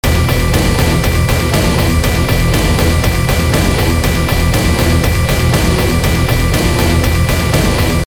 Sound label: loop